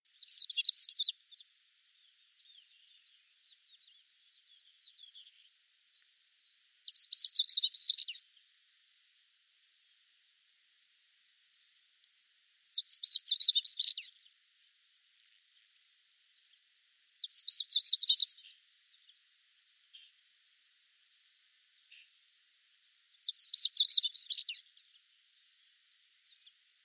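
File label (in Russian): chernogolovyy chekan golos, stonechat voice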